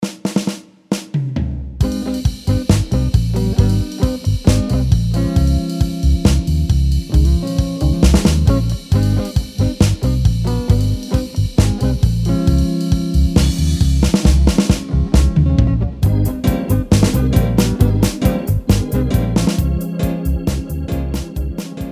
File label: Dub